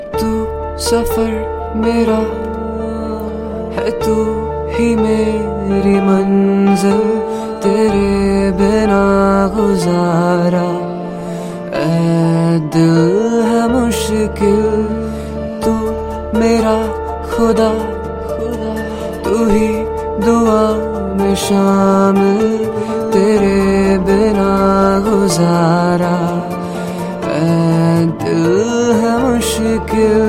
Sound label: Hindi Song Ringtone